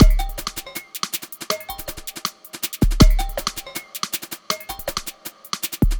Terrestrial Hybrid, Processed Percussion

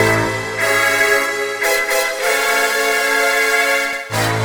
Strings Oldfilm Stab; Sonic Foundry (Sony Creative Software); Knutrix Drum & Bass

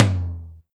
TOM, Eurotech; Sounds Good

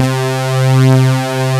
SUPERSAW, synths; Pro-Rec - Synthsonic

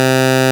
P5 PUL, PLS, Vintage; INIS - Vintage

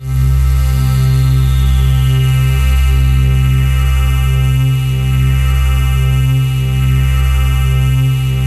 PAD8 C3; Explosive Jungle; USB Soundscan vol.05; AKAI

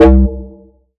Bass F, Bass Synth

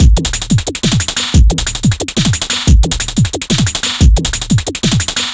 tomsquash 090bpm; Zero-G; Downbeat Dance Grooves

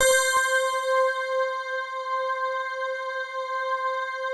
digi pad, Pads; Best Service; XXL 800 Pads; Sample CDs

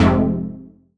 stab relics, stabs

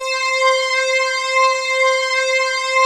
FLANGE C6; Greytsounds - Scott Peers Sound Engineering

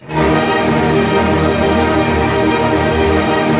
ARP D#, STR ARP MAJ; Sound On Sound - Peter Siedleczek's Orchestra

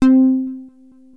Access Virus B; Synthworx

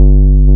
BAS G1, 2 Step; USB Soundscan vol.51; House Side Of 2 Step; AKAI; BASS

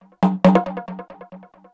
drm 138, Techno, drum-loops

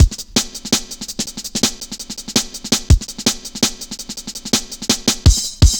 Virtual, Drum Loops, 165.5, Jungle; Zero-G Jungle Warfare 1, 2 & 3

